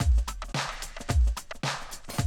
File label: Classic DrumLoops, 110